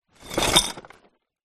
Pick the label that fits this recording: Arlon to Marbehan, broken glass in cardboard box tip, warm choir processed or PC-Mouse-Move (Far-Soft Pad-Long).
broken glass in cardboard box tip